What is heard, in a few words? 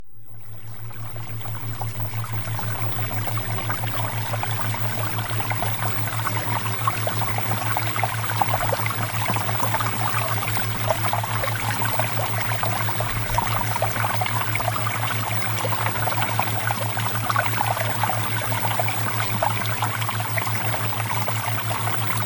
Water Theta Waves; Premium Subliminals; Success With Men